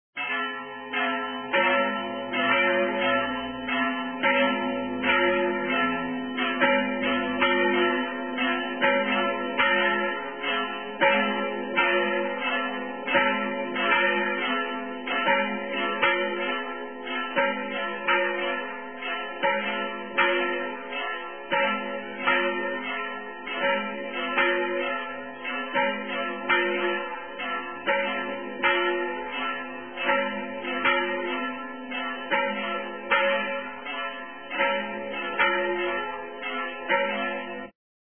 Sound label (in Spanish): campanas